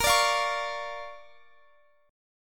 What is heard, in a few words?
D Minor Major 7th Sharp 5th, A sharp